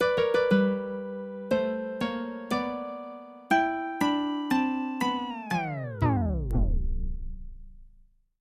BGM Last Boss Harp; Super Mario Maker

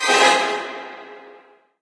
Stab; friday the 13th killer puzzle

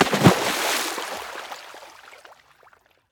splash; Scrat

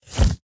Chocolate Grow; Candy Crush Soda

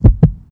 heartbeat fast; Shattered Horizon

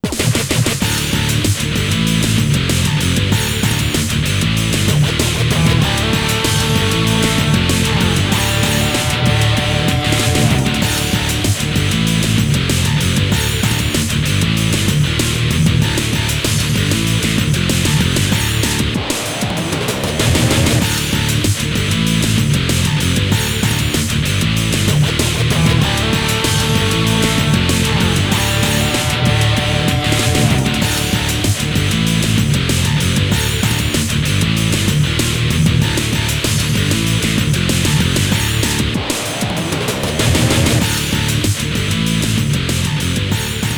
Menu; Super Monkey Ball 2; Monkey Fight 2

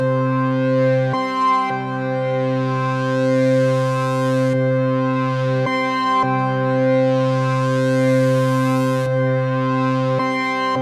Pad Chords; Chroma Shift; windows gamerip 2018

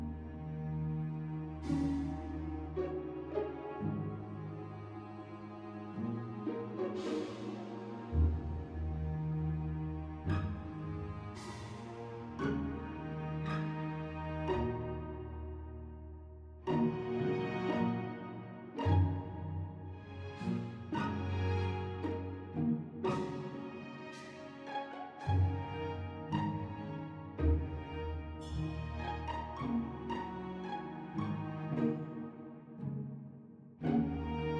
asian strings; The Stonecutter

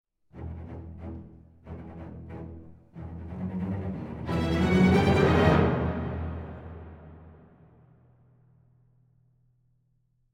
Air Raid; Air Raid 2

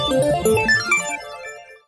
Missionsound

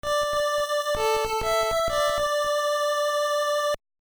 Melody; Mother Earthbound Zero; nes; Melody 8 (Tombstone)

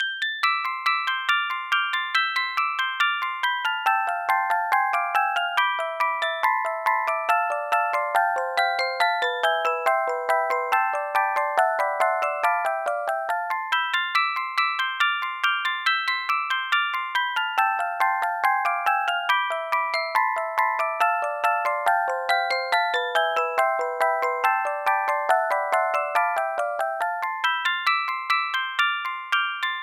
Music Box

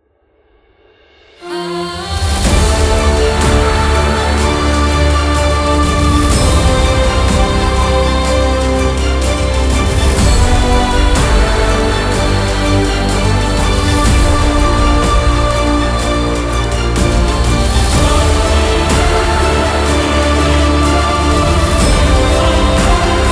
Final battle Won

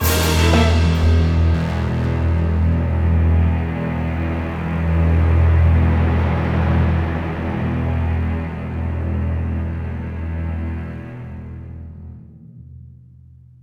Music Stinger Wave Success Stereo; MushroomBoss

